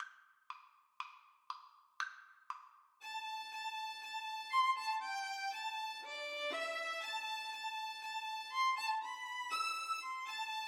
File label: violin duet